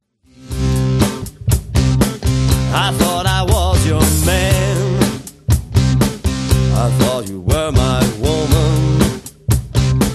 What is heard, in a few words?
shadow blues